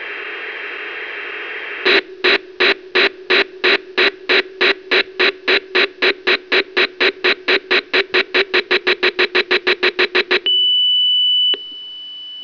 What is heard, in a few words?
steam Tram